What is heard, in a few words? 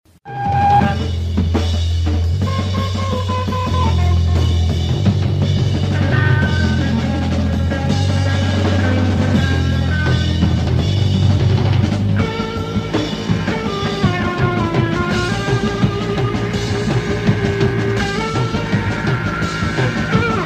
Zulu Stomp